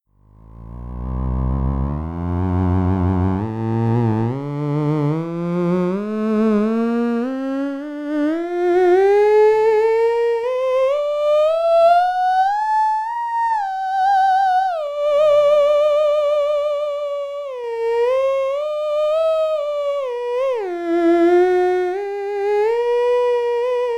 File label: note grab, theremin